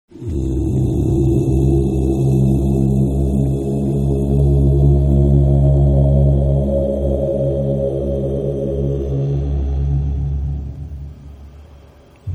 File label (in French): mantra et harmoniques